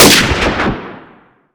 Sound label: R700 Shoot; Modern Weapons Pack